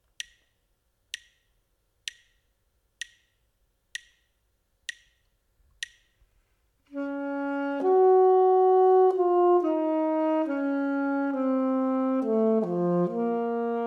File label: melodic error detection